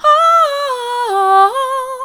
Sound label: ooh soft, vocal